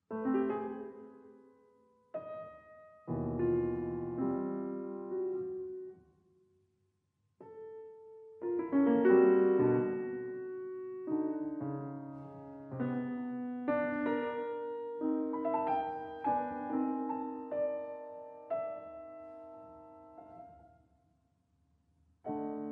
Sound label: Piano Pieces; Six Little Piano Pieces op. 19